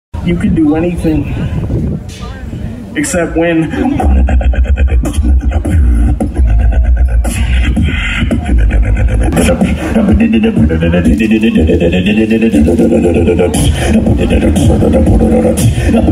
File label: Beatbox Live